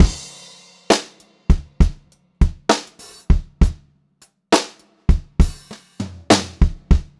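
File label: variation, pop-rock drumloops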